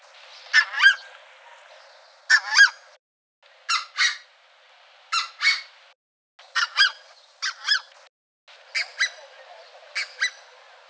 Branta ruficollis two syllable; Red-breasted goose; The contact calls from four different birds, two two-syllable calls per bird

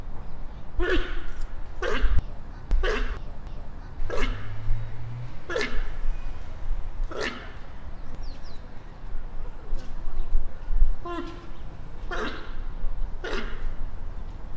Connochaetes gnou female; A series of loud calls of a female, asking to let her go inside the enclosure; wildebeest

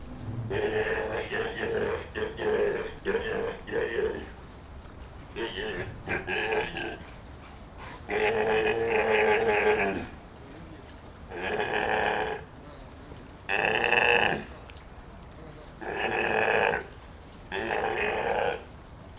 Eumetopias jubatus; Steller's sea lion; A young male is calling when communicates with a human trainer

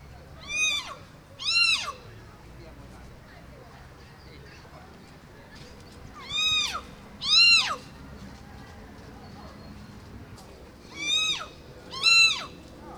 Gracula regiliosa; Hill myna; Some sounds of the hill myna remind the cat meowing

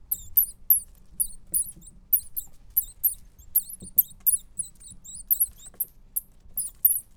Diplomesodon pulchellum squeaks; Loud squeaks of an adult piebald shrew

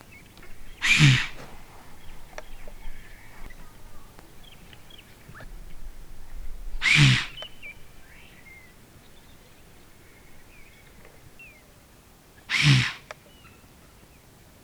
Kobus ellipsiprymnus alarm; Waterbuck; Sequence of snorts – alarm calls at spotting of a researcher

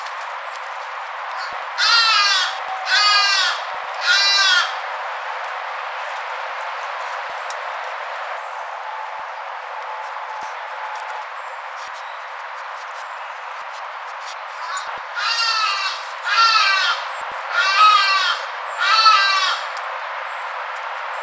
Geronticus eremita; Hermit ibis; Two series of snuffling calls of one bird within a flock

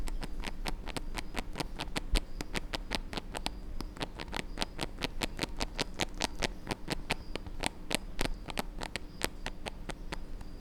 Castor fiber tooth; Pup teeth clicking

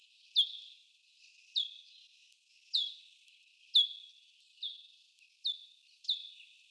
Acinonyx jubatus chirp; cheetah; An adult male is attracting attention of a keeper

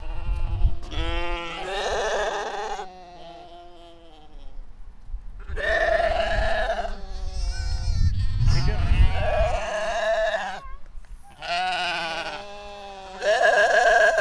Ovis ammon group; Domestic sheep; Ovis ammon f. aries; Calls of different animals at begging for food from people are strongly different even by ear; DOMESTIC MAMMALS

